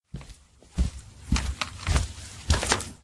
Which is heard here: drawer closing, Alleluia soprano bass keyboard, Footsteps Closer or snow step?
Footsteps Closer